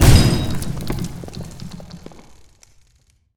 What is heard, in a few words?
glass impact; Damage; Barotrauma; Subsurface